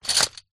office padded envelope containing small plastic items pick up; zapsplat